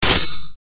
katana

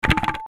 arrow

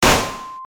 gun

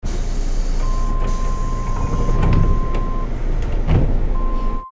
train door

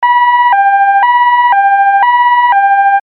siren

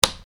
switch

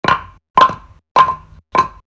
fstep

